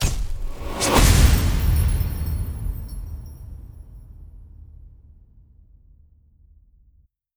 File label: perk select; Skyrim Special Edition